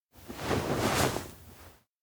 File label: ocarina takeout; anemoi